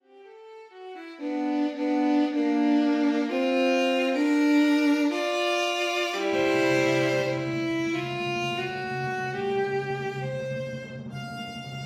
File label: end of violin solo intro original key